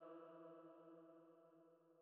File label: Female Pad E2